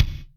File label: BD GATE, Yamaha DTXpress Acoustic Kick